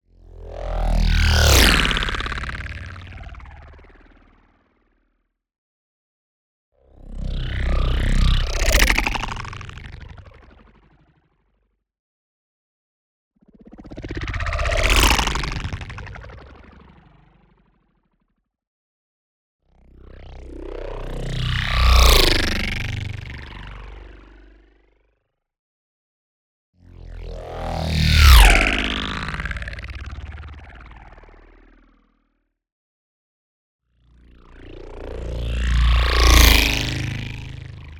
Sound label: NEON WHOOSH LARGE SLOW Abstract Lifeform; boom library